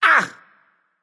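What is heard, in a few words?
non verbal ouch, voice; cernunnos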